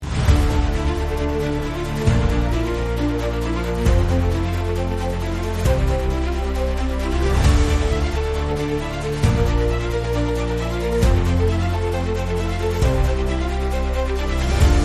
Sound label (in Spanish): Musica epica